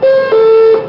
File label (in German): Polizei; Taifun 131; Stefan Ossowski